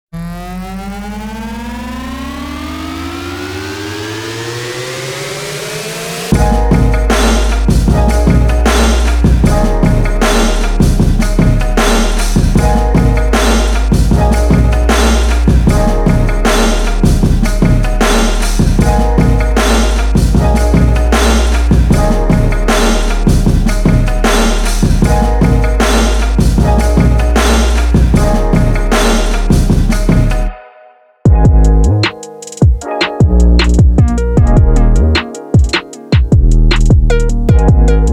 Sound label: LOFI